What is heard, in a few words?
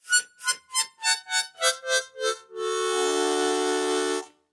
Mouth-organ, Descending Final Chord (7th Chord); woodwinds harmonica